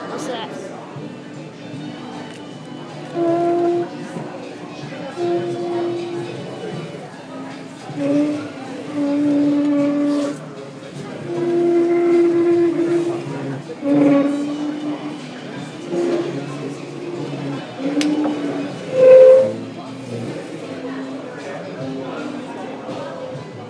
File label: chair